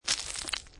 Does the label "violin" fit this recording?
No